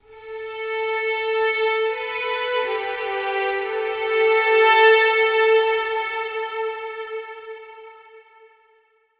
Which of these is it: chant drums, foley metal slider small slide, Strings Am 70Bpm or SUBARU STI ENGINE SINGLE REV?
Strings Am 70Bpm